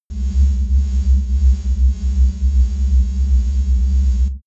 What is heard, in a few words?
space alien machinery